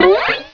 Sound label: Cartoon Sound; PC; MARIOSFUNDAMENTALS